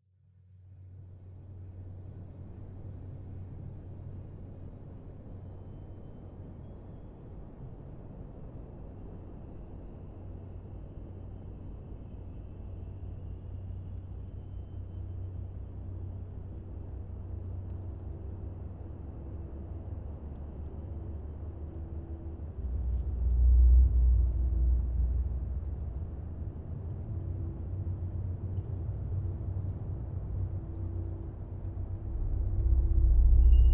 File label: soundscape; trondheim; workshop trondheim